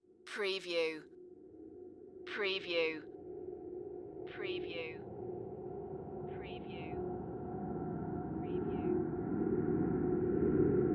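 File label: Production Element Bed Swells; Sound Effects Bible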